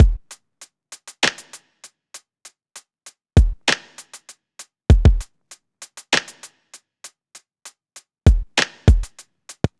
Drum Loop 98 BPM, Hip-Hop; 99Sounds Music Loops; 99Sounds x Monosounds

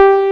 MGV BASS; Pro-Rec - Synthsonic; JV ANALOG

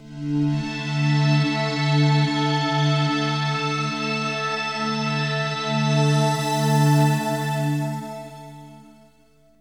OCT 5TH, AMBIENT PAD

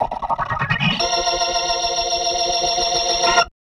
B3 RISE F#; B3 PLAYED

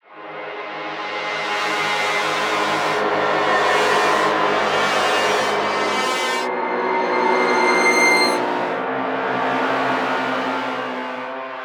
texture grimmajesty, drone pad texture

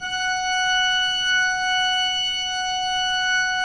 pipe organ, 3-D Audio

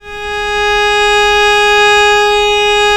G#3, Historical Instruments, VIOLE; USB Soundscan vol.23

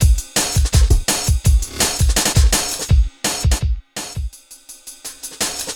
Programmed Stepz, Jungle Drum Loops

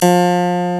CLAVECIN, HARPSICH

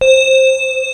MIRROR BELL, ENSONIQ VFX; Rubber Chicken Software Sample Bank; VFX 1

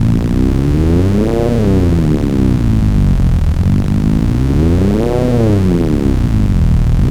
Cwejman Grinder; Sonic Foundry (Sony Creative Software); Afterhours EDM; Session 10 - Come In Hard

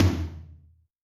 Rock, Tom, Yamaha DTXpress-II

